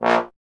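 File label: bass trombone A#; Miroslav Vitous; Solo Instruments VOL-1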